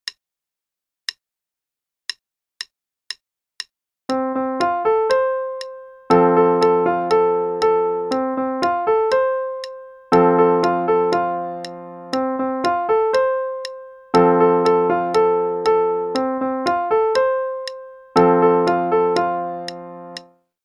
Halftime Show (qn=120); Faber Adult Accerlated; Adult Accel Level 1

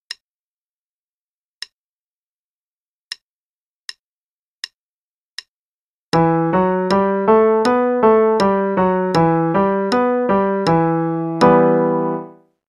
e minor Pattern (qn=80); 5-Finger Pattern; CM Level Prep; Daily Warm-ups

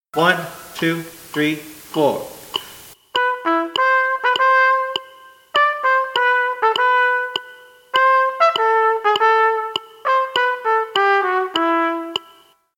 Play-along samples, second phrase, qn=100; Cleopatra's Lament; trumpet

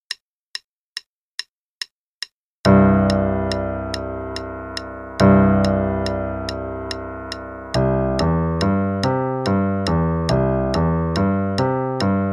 One-Octave Arpeggios, LH, en=144; Level 3A - 2nd Ed

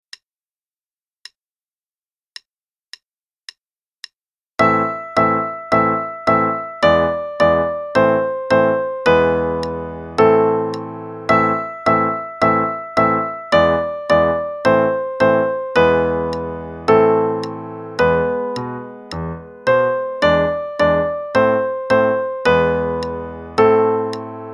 Russian Folk Dance (both parts, qn=108); Level 0 - 0 Primer, Book B - page 32

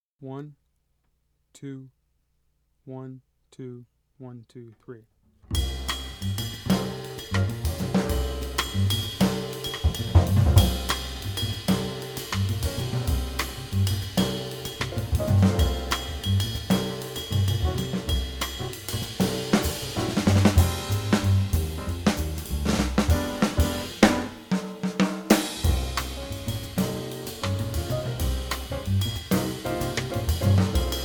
rhythm only; easy jazz conception

